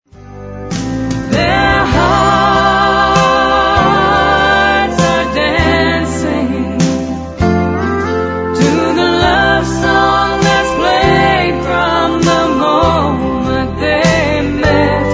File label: Circle S Waltz